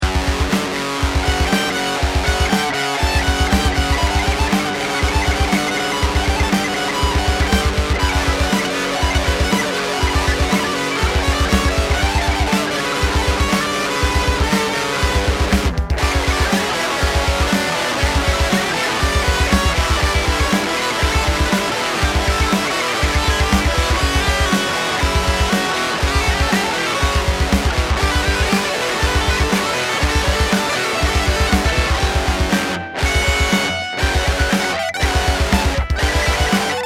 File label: Gloomy Riff